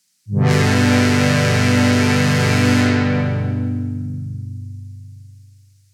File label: Juno Pad; j106